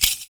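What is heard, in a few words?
PERC, percussions; Univers Sons - Raricussions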